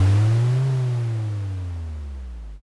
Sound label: dinosaur roar